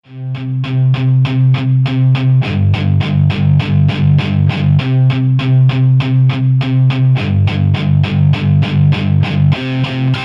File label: rock guitar